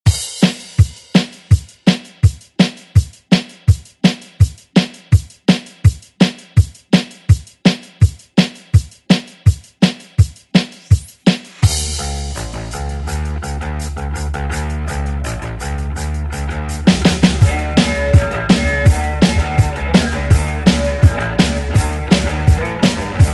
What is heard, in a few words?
80s ReDrum; DJ Mhark